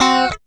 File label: FUNKY, Grooves; USB Soundscan vol.31; Miro s Grooves; AKAI